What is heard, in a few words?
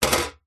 industrial tool metal med heavy set down on metal; zapsplat